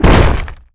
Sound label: rock impact spike trap